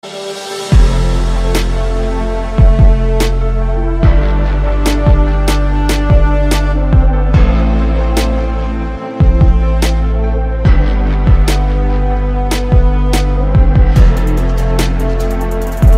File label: Trap Remix; PUBG